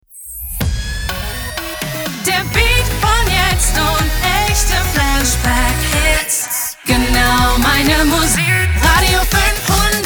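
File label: Sung Jingle, 124bpm B major, Slogan; RADIO 500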